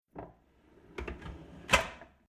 Household Domestic Kitchen Cutlery Draw Modern Sliding Closed Metal Rattle; Drawer